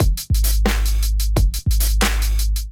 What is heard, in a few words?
Kit 88 bpm, Drums; MIDI Clips; Full Lotus; Ableton; Factory Packs; Chop and Swing